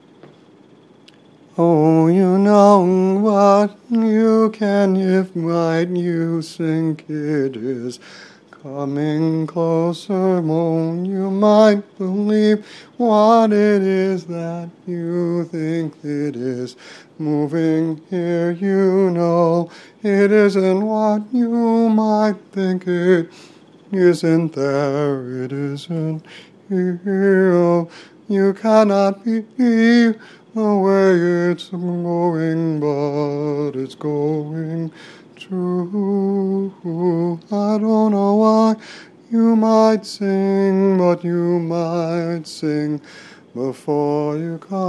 song sung wearing a full suit

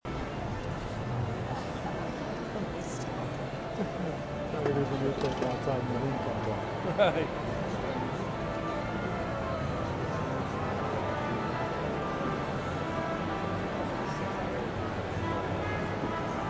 orchestra; philadelphia

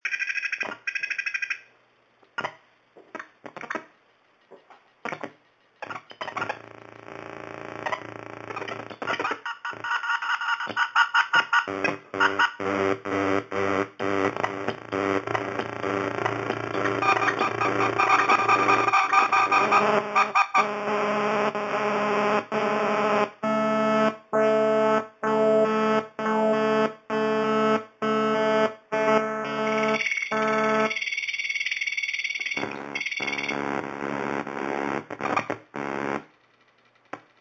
self generating patch soundtracks my cleaning

